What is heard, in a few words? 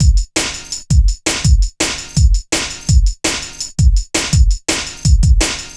Metallic Rolla, Drum Loops 166.5; Zero-G Jungle Warfare 1, 2 & 3